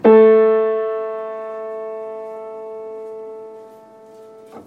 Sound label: Spinet A3 A4 pure just octave